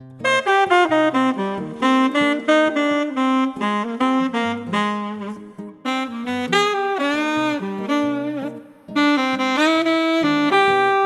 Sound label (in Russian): Utrennij dzhaz; Zvuki Utro